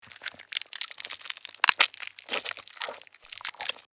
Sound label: Fantasy creature eating flesh